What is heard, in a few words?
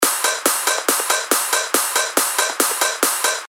140 BPM, techno loops; Tantamount; Revealed Techno Top Loops Vol. 8